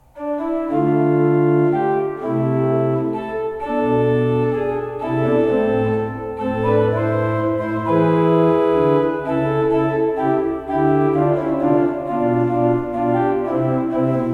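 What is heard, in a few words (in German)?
Van Vulpen 12 II P, pfeifenorgeln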